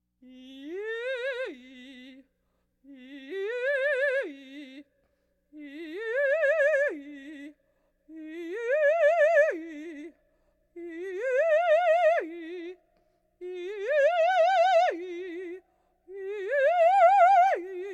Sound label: Laugh tracks